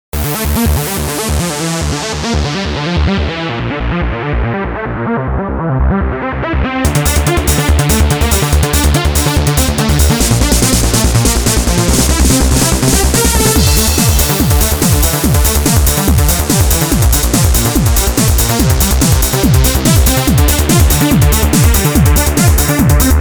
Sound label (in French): trance lead gros son